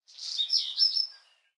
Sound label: Ambience Menu Little Bird